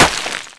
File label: hit dirt